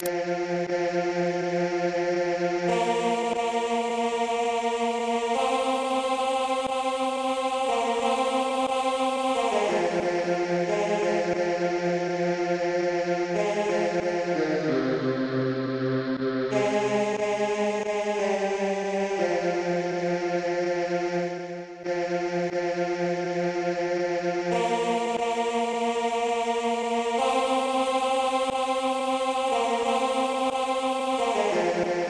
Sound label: go down moses tenor